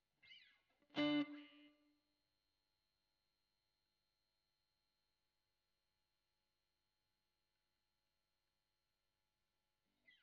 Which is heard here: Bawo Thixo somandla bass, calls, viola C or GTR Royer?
GTR Royer